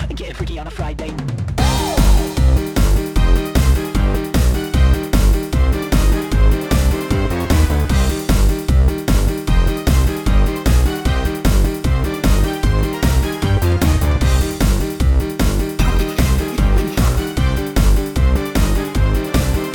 Instrumental; Chips Ahoyeth; Friday Night Funkin' Seeks Cool Deltarune mod soundtrack Windows gamerip 2022

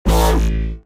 Dubstep Shot

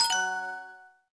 Correct

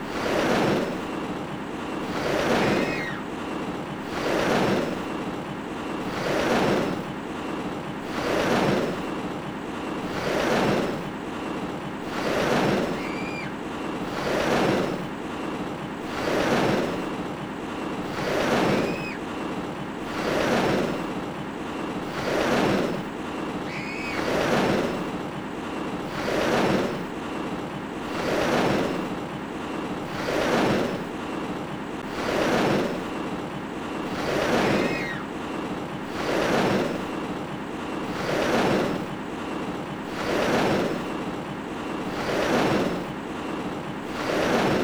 Roller Coaster (Slow); Kakyuusei; Saturn

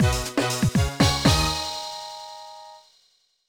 Win; My Secret World by Imagine; nds; Bomb a' bomb

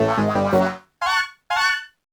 Event fail; littlebigplanet 2 ps3 gamerip 2011

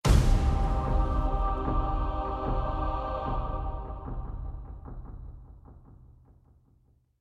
Success

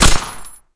UMP45

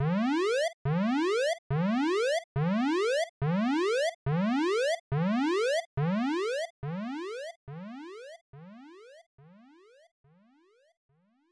Alarm

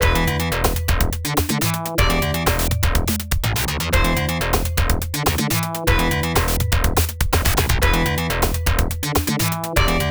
Background (Machinery); Junction; Genesis

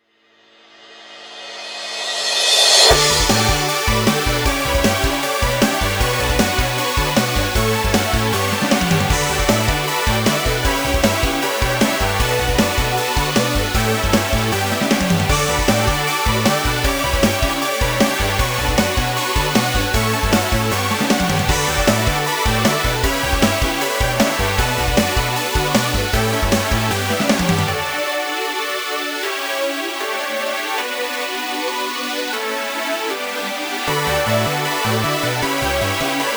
Instrumental; End Goal; Friday Night Funkin' Funkin' Origins OST mod gamerip PC 2022

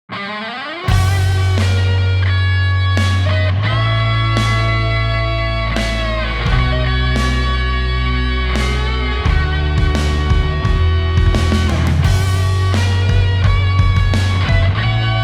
music credits dragon

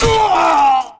player down

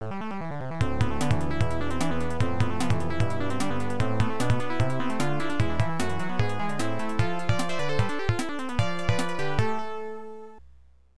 Area Clear; hydefos hyper defending force system msx2 opll

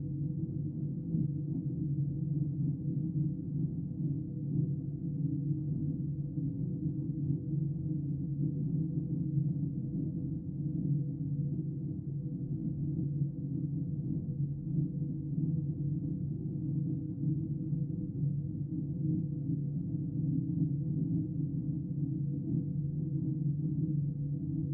Ambient Hospital; silent hill homecoming 2008 gamerip pc ps3 x360

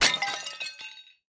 Break Vase; boomerang fu

